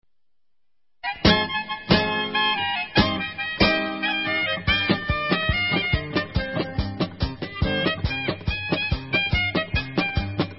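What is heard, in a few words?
Instrumental, Israeli Dances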